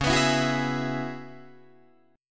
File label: C sharp Minor 11th C sharp, Guitar DADGAD; C#m11 strummed